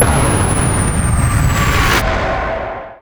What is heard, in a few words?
sunstrike charge apex; weapons; dota; invoker